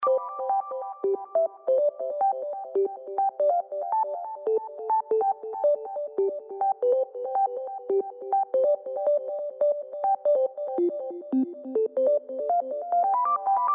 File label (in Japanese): 素サイン波加工後